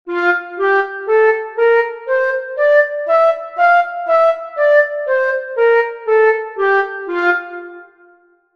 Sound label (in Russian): gamma fa magor stakkato, flauta